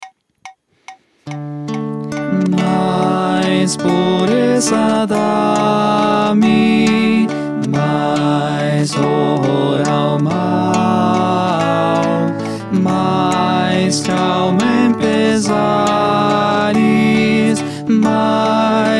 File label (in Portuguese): soprano oitavado